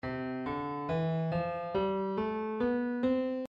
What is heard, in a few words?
C M scale